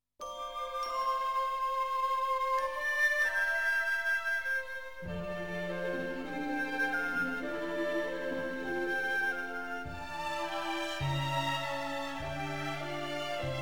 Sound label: instrumental